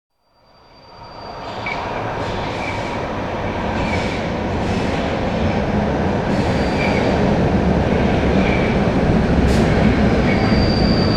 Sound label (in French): Métro; bruitage